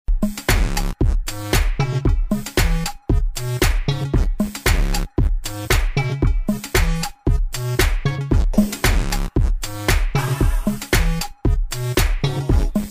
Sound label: Instrumental